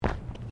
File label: FOOTSTEPS SNEAKERS PLASTIC GROUND MAT STEP; Footstep Foley; Footsteps (Sound Effects:Human)